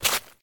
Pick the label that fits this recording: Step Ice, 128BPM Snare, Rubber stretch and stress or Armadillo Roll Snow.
Step Ice